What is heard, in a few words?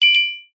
multimedia alert cute ping bell ring; zapsplat